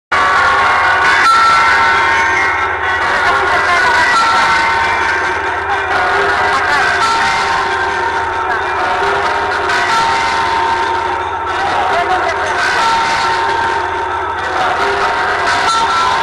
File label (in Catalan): campana